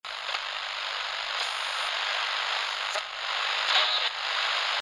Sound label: Radio Adjustment; glitch